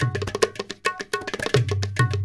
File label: India, Heart of Asia